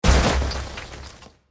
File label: Massive Impact Blast With Various Particles; Blasts; CraftedSignals